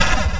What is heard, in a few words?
flame bullet; mazeofgalious; jorito